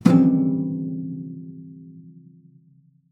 SPINET; Xsample VOL-7

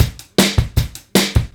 Look Good, 155, Beat Loops; Zero-G; Monster Beats

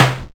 Snare, Drums; Bitkits

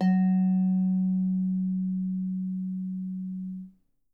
F#3, Percussion & Harp; Miroslav Vitous

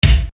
Bass Dr, EuroDance Bdrums

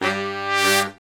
BRS SHRT, R&R Horns, Sect; Roland L-CDX-03

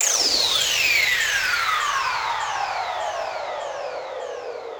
Comet Trail; Zero-G - Future Wave

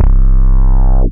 DM BAS, Dance Mega Synth; Best Service; 90 s Sample CDs

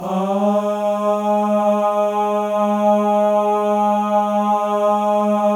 MALE AHS; Spectrasonics; Symphony of Voices

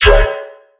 bass hit strong yoink D; Virtual Riot

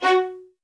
MED ATT F# 3, soft violins; Q Up Arts; Denny Jaeger Master Violin Library

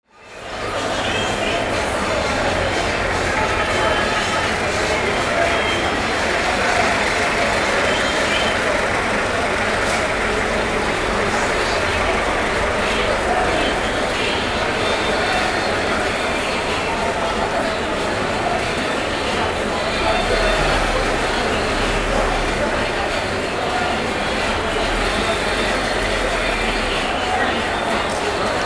AMBIENCE, Arabian Traditions

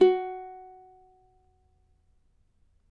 CAVAQUINHO F#, Guitars

